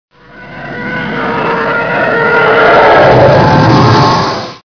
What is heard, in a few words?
Tie Fighter Flyby Sound Effect